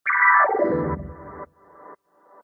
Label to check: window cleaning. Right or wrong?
Wrong